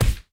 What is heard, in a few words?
counter impact; serengetikings